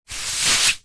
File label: foley sleep mask slide on or off over eyes